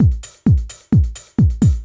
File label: beat